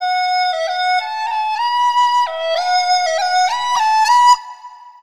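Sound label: Chinese FLUTE; Soundscan vol.60; Asian Traditions; AKAI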